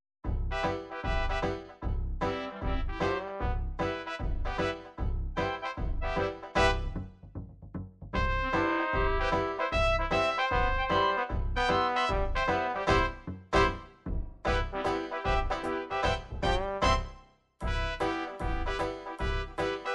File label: jazz dixieland, midi